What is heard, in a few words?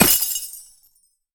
ice spell impact shatter; Infernal Radiation